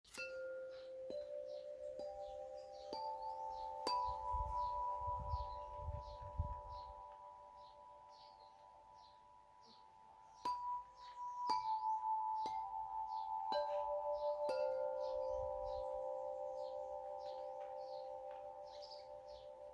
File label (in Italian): Pentatonica Jonio